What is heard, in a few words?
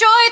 vocal